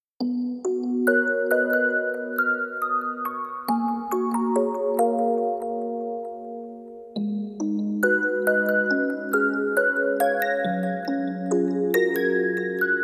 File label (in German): Orgel; 向日葵の教会と長い夏休み サウンドトラックCD Disc2